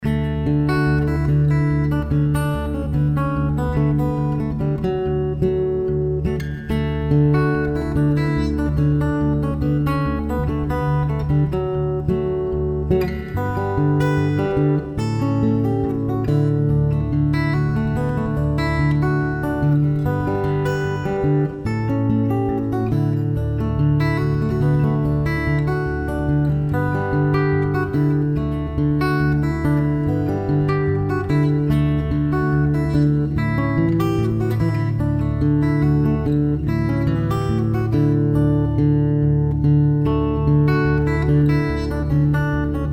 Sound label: Variation, bluesroots fingerstyle tunes